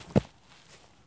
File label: landing grass low; Escape from Tarkov